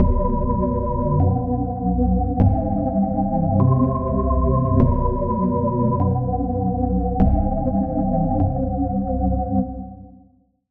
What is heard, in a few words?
key, Sylenth1; Routed Sound; Sylenth1 Expansion - Street Vanquisher Vol.1